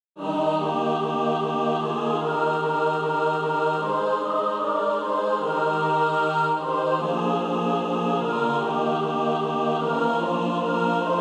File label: mixed; SATB